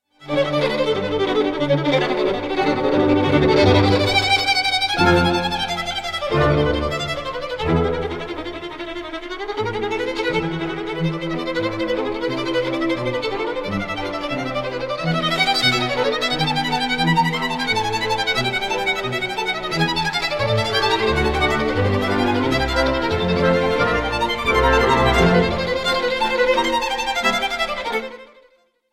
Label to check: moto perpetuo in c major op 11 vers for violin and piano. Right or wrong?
Right